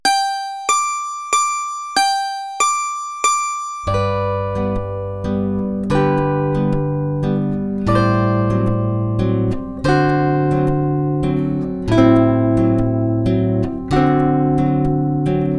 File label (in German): Kleiner Walzer, Zither; Neue Zitherschule